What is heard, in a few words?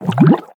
Object Exit Water